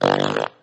fart short

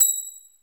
Bells

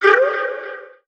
"erh"; ooh ahh

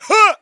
huh; Voice; ooh ahh

